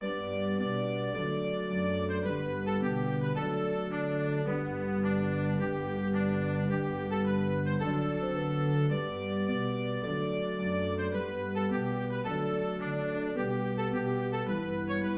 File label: Trumpet Tune; Purcell